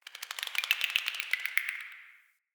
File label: cave shrine critter